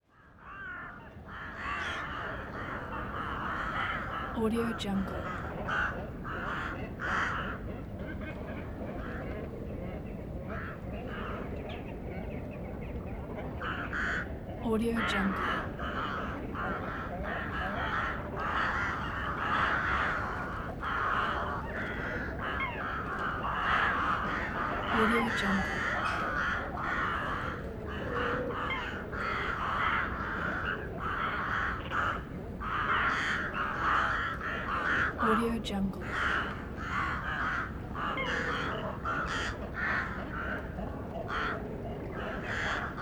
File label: winter wetland wildlife with ravens